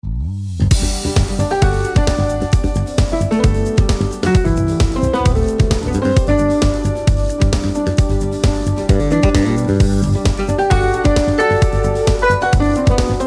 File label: Phone Default ringtone